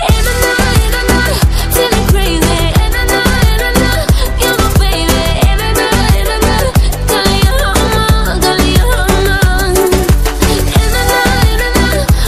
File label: Romanian Dance